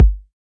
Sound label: 144bpm, Ab min, Kick